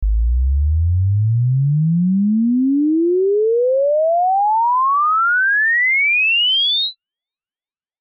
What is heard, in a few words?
Sine 50-8000 hz 0dB sine